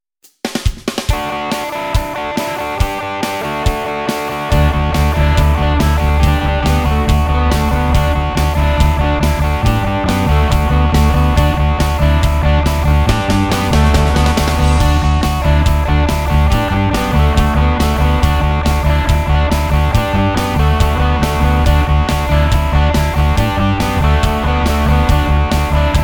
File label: Let me check Karaoke